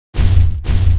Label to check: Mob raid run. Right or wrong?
Right